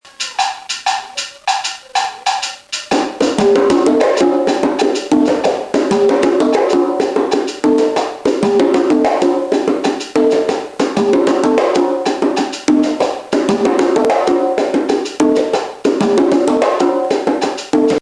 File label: guaguanco, congas; Guaguanco style developed by the author; ORIGIN Cuba; rhythms; Guaguanco Frank's Version